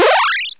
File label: powerup